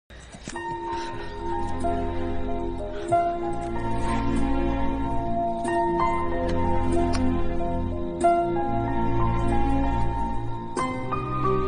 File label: love bgm